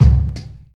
boomy bass drum sound c sharp key; kick

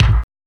old school rich high end steel kick drum a key

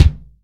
punchy bass drum single shot d key

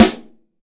smooth bottom end acoustic snare a key

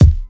rap bass drum single shot c sharp key

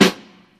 subtle reverb steel snare drum f sharp key

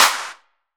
hand clap sound c sharp key; single hits one shots

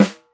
dry snare drum sample g key

